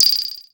ANALOG RATTLE, SYNTH PERC; Zero-G; Ambient 1

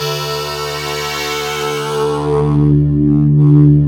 PPG sweep, PPG WAVE 2; Greytsounds VOL-1

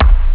bdrum; Amiga Soundtracker Sample Packs

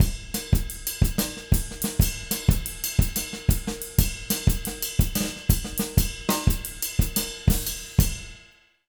Jazz Latin Drumloops; USB Soundscan vol.08; AKAI

